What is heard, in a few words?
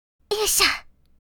Effort grunt anime girl vocalization